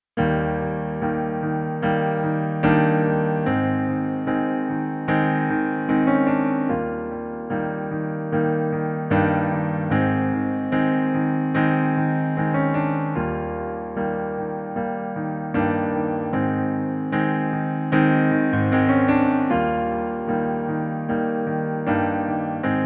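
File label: piano playback Eb major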